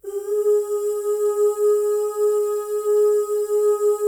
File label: FEM OOS, Symphony of Voices; Spectrasonics